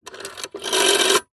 household telephone old fashioned rotary style recorded underside dial no two; zapsplat